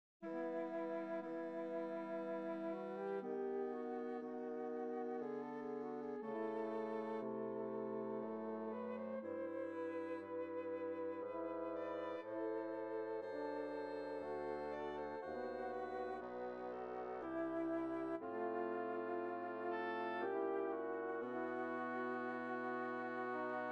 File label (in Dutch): harmonische dictees blaas 5tet